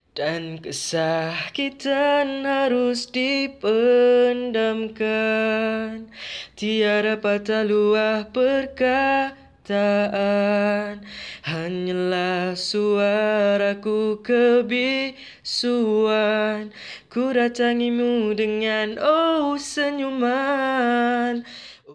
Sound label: Chorus